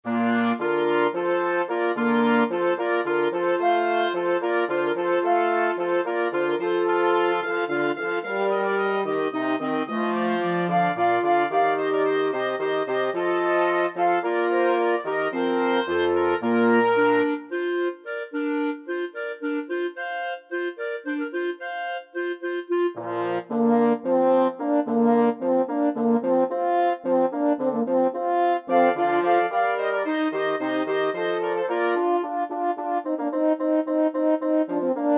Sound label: water music, flexible mixed ensemble 6 players